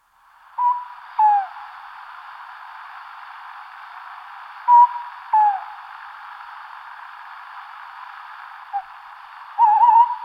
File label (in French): chouette hulotte cri